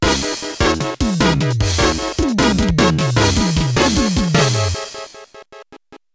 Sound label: Victory!; Mobile Suit Gundam EX Revue; arcade